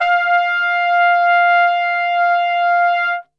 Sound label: F 3, Brass; Best Service; Brass Super Section